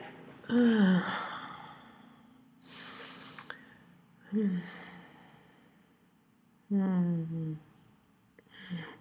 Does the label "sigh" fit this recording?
Yes